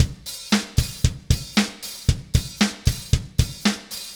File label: Haze Beat, Indie Rock; Sonic Foundry (Sony Creative Software); Indie Rock Anthems; Session 06 - Haze